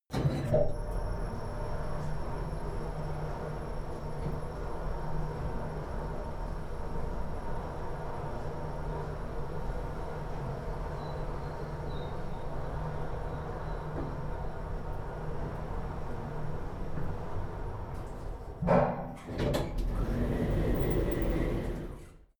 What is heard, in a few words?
elevator ride buzz high